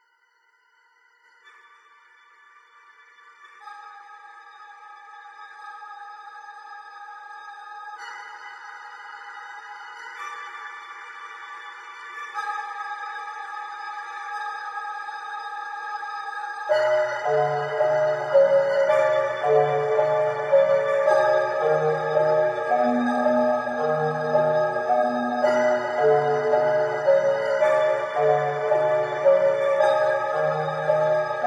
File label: menu, ambience music; PhoenixCraft 1.16.5